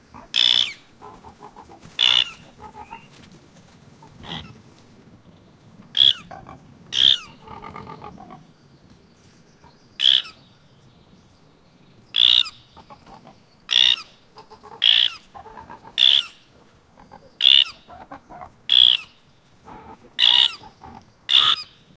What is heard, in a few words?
pig screech, farm; 17 types